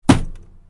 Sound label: thud; pixabay